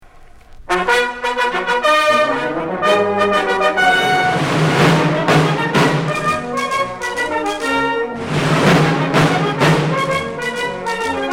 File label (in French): Marche impériale, Les Tambours; batteries historiques et marches modernes